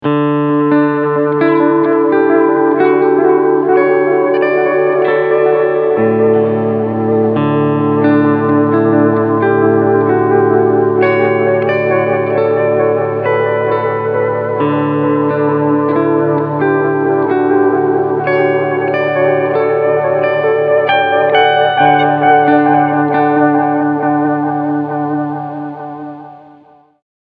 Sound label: long delay clean; t-rex replicator